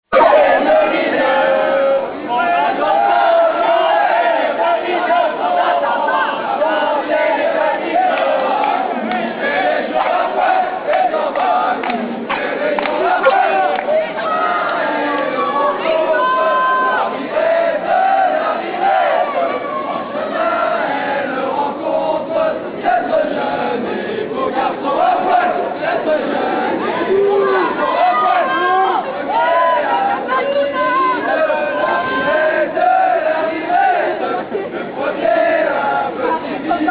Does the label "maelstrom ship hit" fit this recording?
No